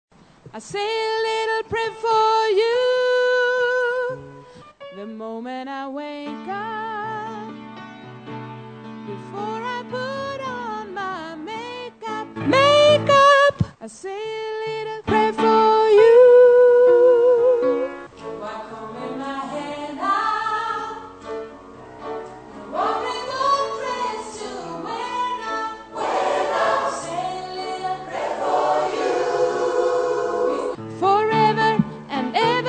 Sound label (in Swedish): sopran, stammor